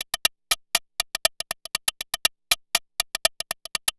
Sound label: Percussion, Trance NRG, Drums